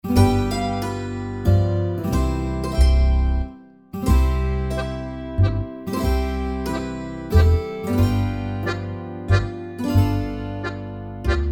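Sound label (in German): Jodler, Flötentöne; 28 - Jodler 1 (Playalong); Jede Menge Floetentoene 1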